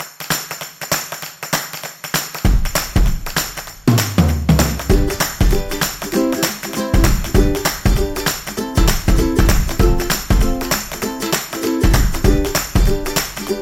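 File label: Funny Kids, Kids background music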